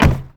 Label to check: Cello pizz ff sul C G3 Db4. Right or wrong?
Wrong